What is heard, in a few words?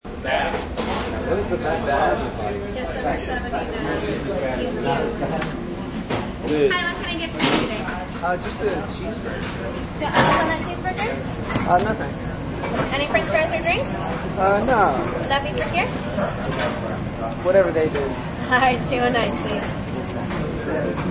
Burger Joint, Fastfood place ambience.; Burger Joint 1; Restaurants